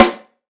Deep Steel Snare Drum Sample C Key, steel snare drum sound tuned to the C note